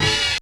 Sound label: Hit; ZERO G - Datafile DISC 1